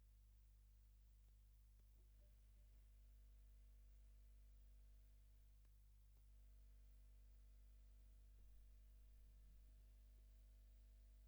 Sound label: Gtr Line